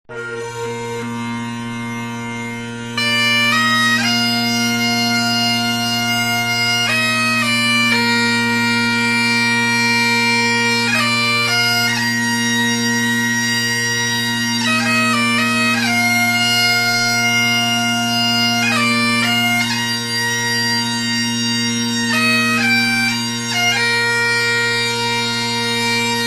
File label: Kilted Music; HIGHLANDER